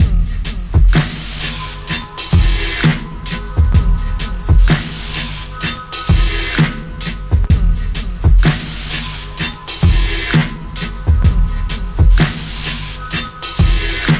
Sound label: 064 atmospheric paddy, drum loops and breaks; 000 to 080 bpm